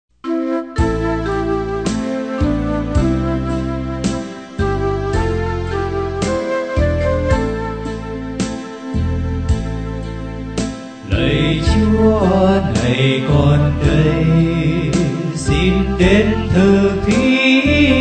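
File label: soprano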